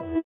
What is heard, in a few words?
sitar, Indiatek; Spectrasonics; Metamorphosis